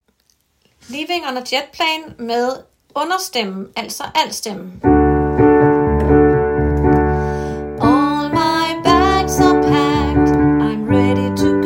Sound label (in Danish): understemme, kor